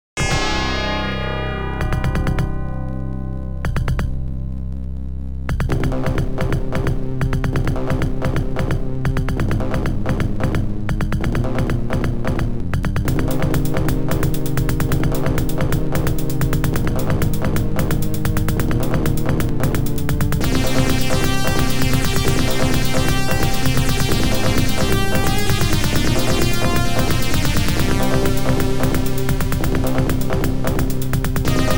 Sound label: OPL2; Tyrian